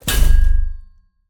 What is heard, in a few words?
melee sword attack; sonniss gdc